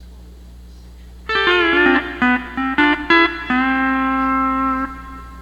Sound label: 12 String Lap